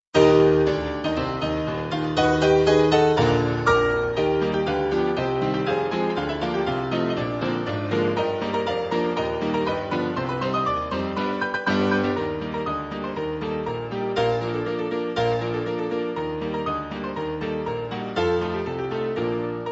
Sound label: star stripe, piano for two; 星条旗よ永遠なれ／スーザ